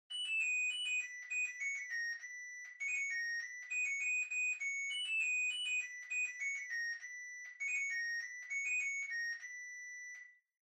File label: Washer Front Load Beep Far Melody